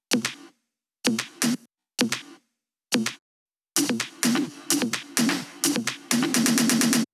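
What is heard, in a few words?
Drum Loop 128BPM Percussion; VEC5 Drumloops; VEC5 Multi Loops 128BPM; Loop Elements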